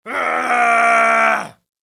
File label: cool sound round